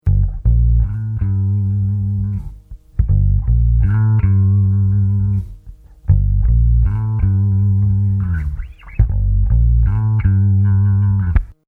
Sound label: Bass Comp EQ